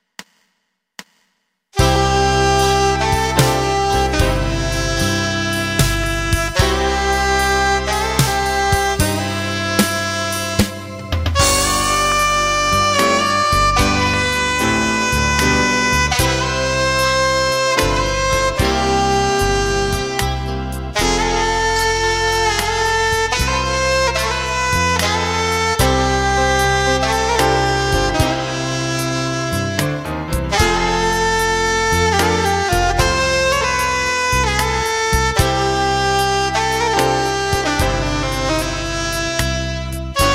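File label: Silent Night Alto Sax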